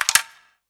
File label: perc; eddyflux